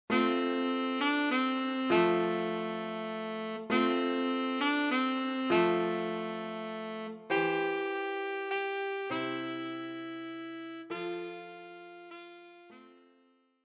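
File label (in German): Stille Nacht heilige Nacht, Altsaxophon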